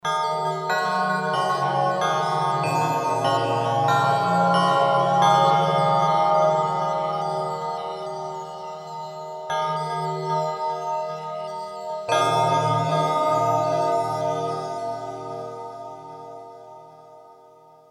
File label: bell castle, synth; bells intro; Alesis qsr